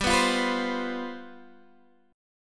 G 11th, Guitar, Open G; x,12,9,10,12,10; down

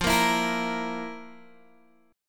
F Major 7th Suspended 4th, Guitar, DADGAD; 15,13,14,15,13,x; down; chord shape

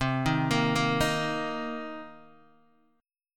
C Minor 7th C Guitar Open D

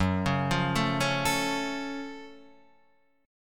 Gb Minor Major 7th Gb down Guitar Half Step Down

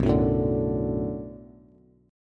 B Minor 7th B Bass, chord; Bm7; strummed; JGuitar

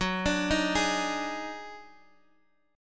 Eb Minor Major 7th Double Flat 5th Gb

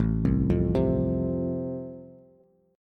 A Minor Sharp 5th A, Bass; arpeggiated; JGuitar

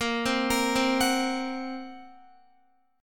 A sharp Suspended 2nd Sharp 5th A sharp, Guitar; x,13,12,15,12,14; arpeggiated; JGuitar

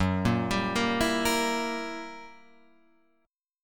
Gb 7th Flat 9th down Guitar Open F, Gb7b9 chord; arpeggiated; JGuitar

